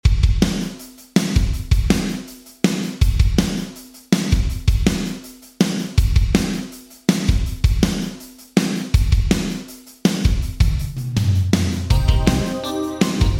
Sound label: Drums Klark Teknik DN 780 Alive Vintage Digital